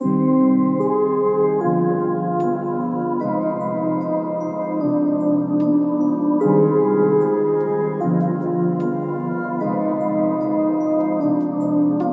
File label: Default Notification Sound; Samsung